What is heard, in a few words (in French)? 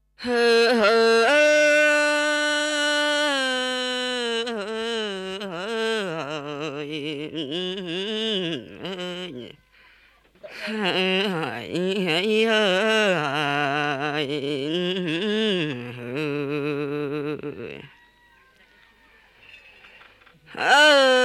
Chant courtois, Vietnam